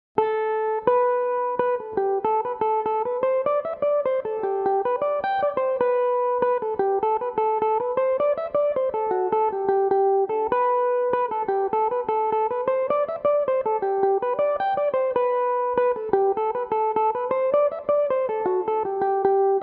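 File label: G major, mandolin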